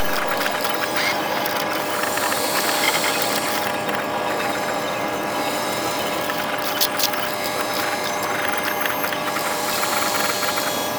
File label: Computer Beep; Warframe; Veilbreaker; Kahl Quest